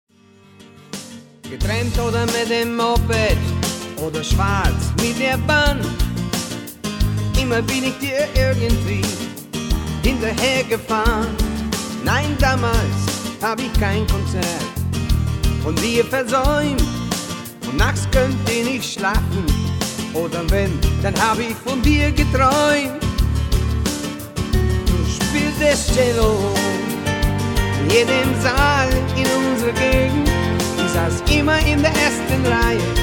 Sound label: CELLO